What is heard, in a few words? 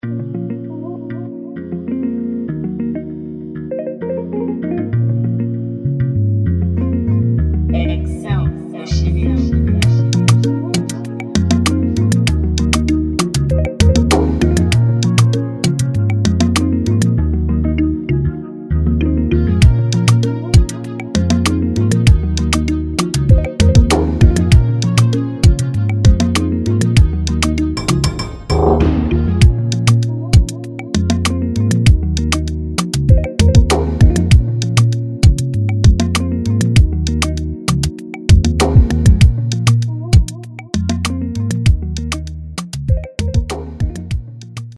Freebeat Modern Afrobeat